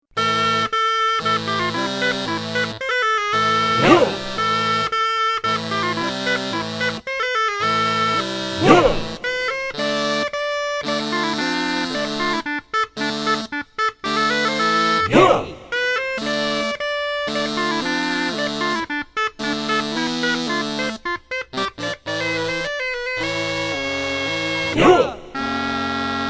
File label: Krumhorns